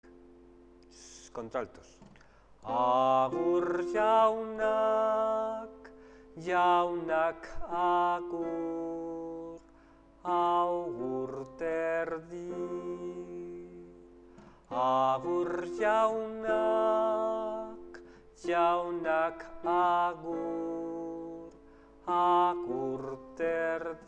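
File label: alto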